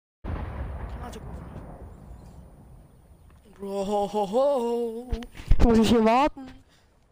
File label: hohohoho